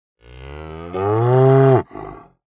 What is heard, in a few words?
cow